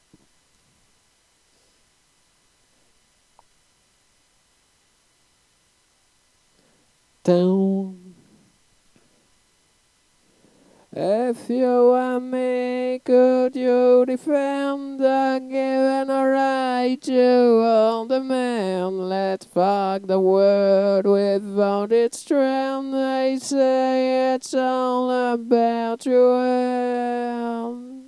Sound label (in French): chant deuxieme voix refrain